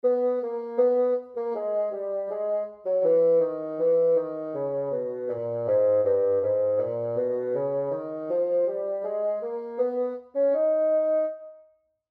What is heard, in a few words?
Bass Clef Example